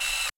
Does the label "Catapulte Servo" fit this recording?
Yes